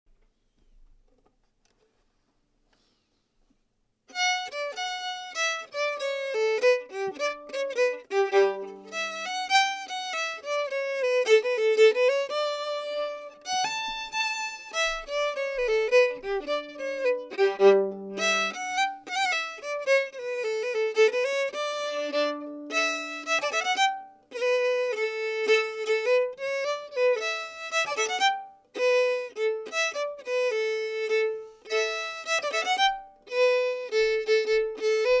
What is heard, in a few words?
solo fiddle